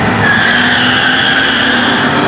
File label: car skid; Max Payne; tenements